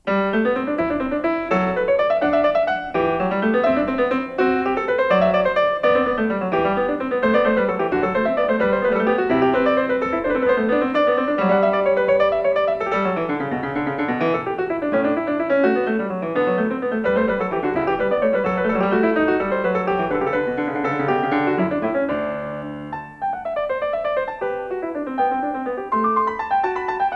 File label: Variatio 27. Canone alla Nona a 2 Clav.; 1955 Recording; Bach; 골드베르크 변주곡